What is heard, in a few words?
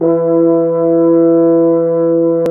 Brass Mute; CMI